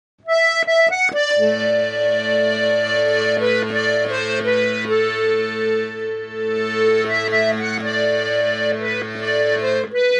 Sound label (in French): Bal gavotte